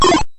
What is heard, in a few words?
block rotate